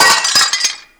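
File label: glass break; SoundFactory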